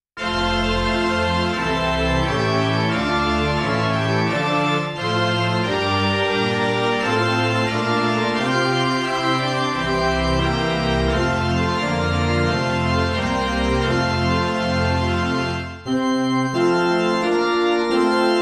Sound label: midi